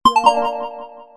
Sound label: vib off effect